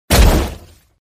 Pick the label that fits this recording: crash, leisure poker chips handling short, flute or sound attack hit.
sound attack hit